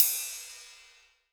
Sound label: Ride, Cymbals